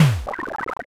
door kick

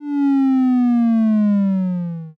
picosleepy

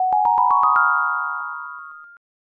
rainbow

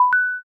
quick press

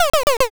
laugh single

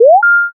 unlock

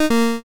judge miss

